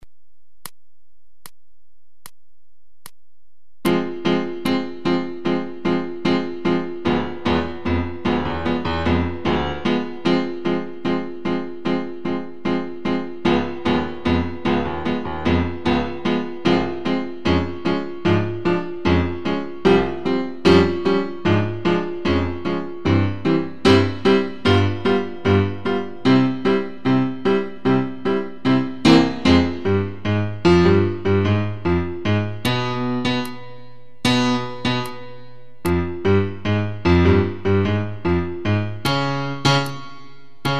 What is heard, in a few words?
Charleston